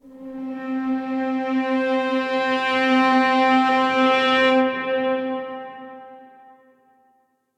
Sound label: Glacial (Slide & Pressure) - String Quartet; Instruments; MPE; Ableton; Factory Packs; String Quartet by Spitfire Audio